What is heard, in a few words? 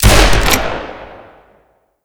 remington, weapons; gmod